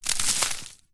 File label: wood chippings handful drop onto pile